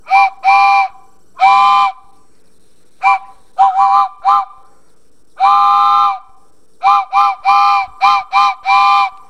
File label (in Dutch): geluid speelgoedtruin